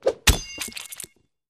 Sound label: Beheading Axe, whooshes & swishes